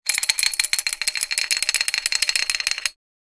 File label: Chain pulling through mechanism